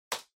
PC Keyboard Far Enter Hard

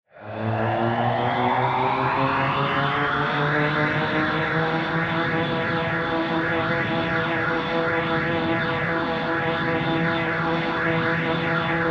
Retro Anime Laser Charge

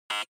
Electromagnetic Buzzer, Dry

